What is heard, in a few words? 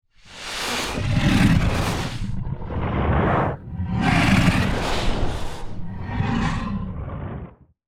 Dragon sniff breathy inhaling and exhaling